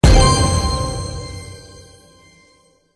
bonus prize trigger; Shamans Dream 2

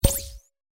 lights value picked; Fondant Favourites StarTrail; Eyecon

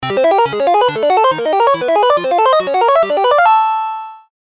anticipation Arps; Step Back 7's; anticipations

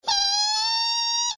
scream; Bouncy Balls 2; blue

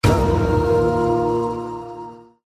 special Symbol land; Shamans Dream 2

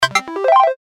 line win; Step Back 7's

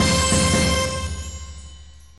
free games prize pick trigger; Fluffy Favourites Mix 'n' Win

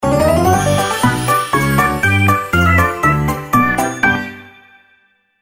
super Win; Fluffy Favourites Remastered; win-levels

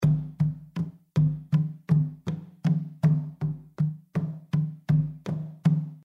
drummers Idle Loop; tiki-beats; eyecon

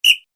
whistle short pip; Football! LuckyTap; Eyecon

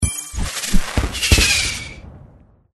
fireworks; Fluffy Favourites Lucky Tap

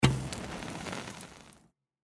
torch Light; tiki-beats; eyecon

